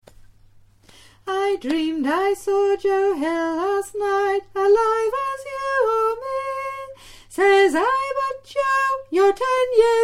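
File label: Soprano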